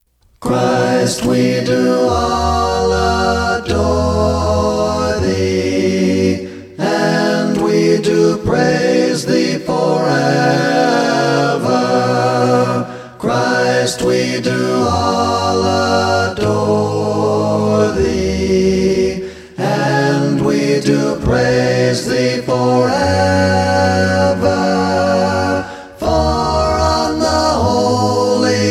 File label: music english